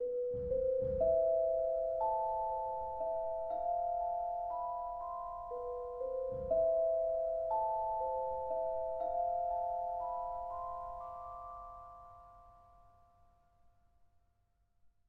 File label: mountainvillage lowkey bgm head 60bpm